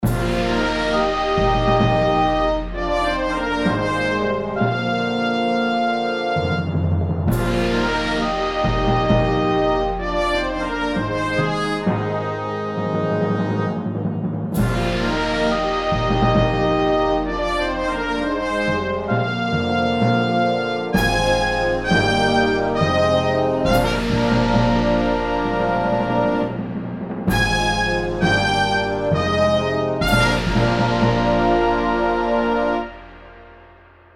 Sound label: Fanfare